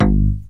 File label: bass